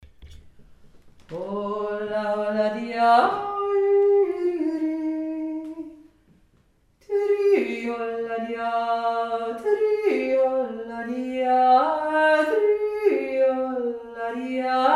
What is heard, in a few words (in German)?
rauschige hauptstimme